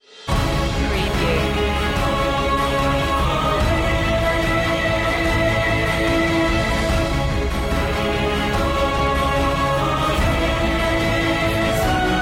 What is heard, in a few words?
with choir